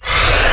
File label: force strength start